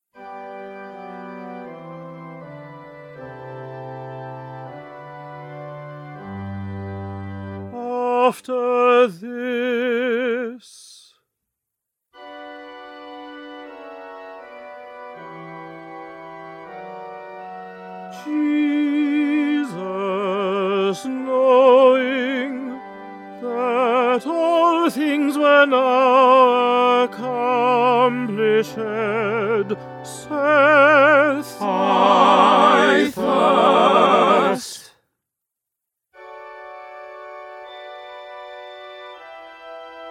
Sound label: Concert Version